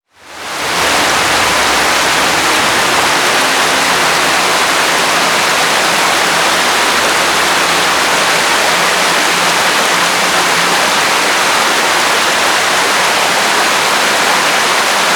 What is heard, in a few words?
Rain Sounds Soothing Rainfall